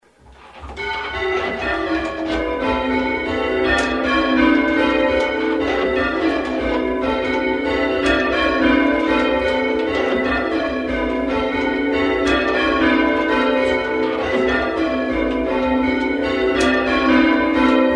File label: rounds; st mary hale